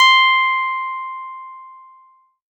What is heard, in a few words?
PNO C6, Roland MKS-80 synth keys; Leads and Keys